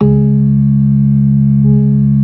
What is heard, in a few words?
B3 C#3; Best Service Hyper Dance